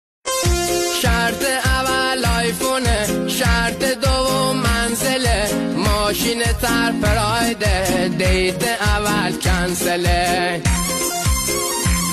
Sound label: iphone